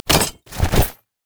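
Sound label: soraka death foley; LoL; cleric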